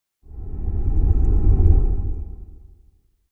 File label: Flame; Sound Design